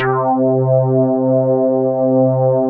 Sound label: Dance Floor Bass, Multisample Basses